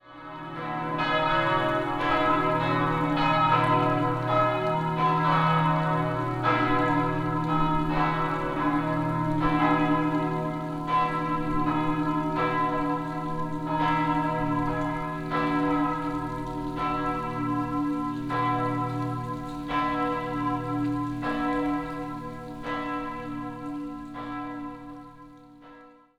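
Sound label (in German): durchschnittliches geläute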